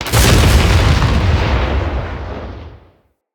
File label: Tesla Shot; Encounters; Ode Pollution Tower